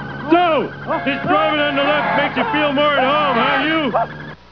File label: drive left